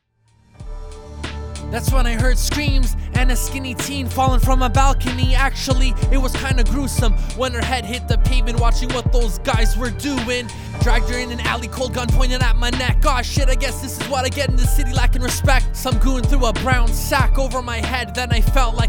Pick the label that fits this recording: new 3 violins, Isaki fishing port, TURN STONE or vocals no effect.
vocals no effect